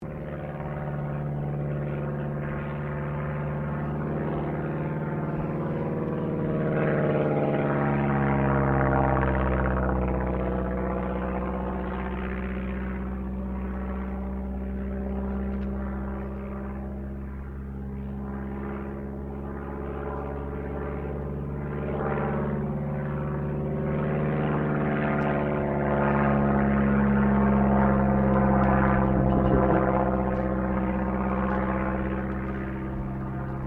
AIRCRAFT DH82A DEHAVILAND TIGERMOTH PASS VERY SLOW; 1942 Tigermoth; Airplane; Vehicles; Aircraft Collection